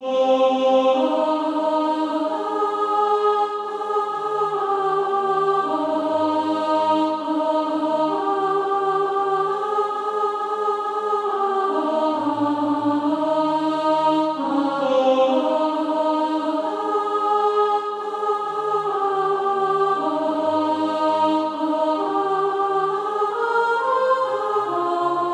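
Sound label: alto, SATB Practise Music; Christ Jesus Hath the Power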